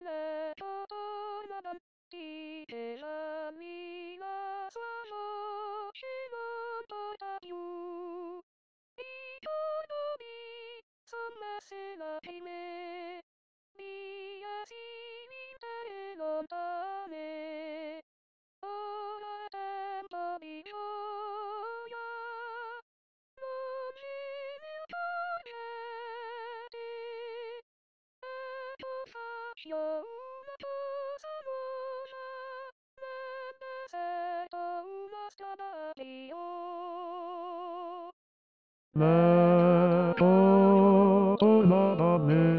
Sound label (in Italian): Ora e Tempo di Gioia Bassi